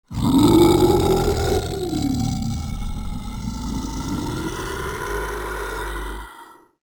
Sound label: scary monster growl roar